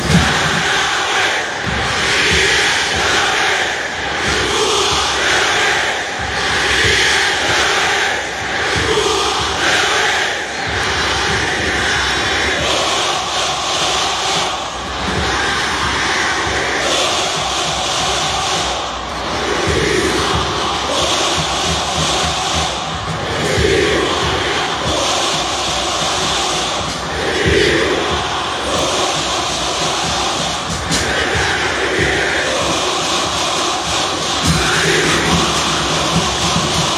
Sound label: fanchants; y hly sm fy lwjwd dwbl fyrj